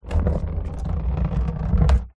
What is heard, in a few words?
foley plastic tub large roll on concrete; zapsplat